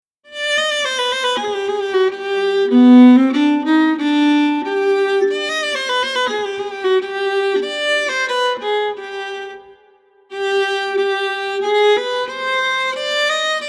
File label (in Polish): Altówka